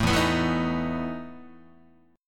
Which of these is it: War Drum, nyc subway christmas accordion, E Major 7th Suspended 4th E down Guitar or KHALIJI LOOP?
E Major 7th Suspended 4th E down Guitar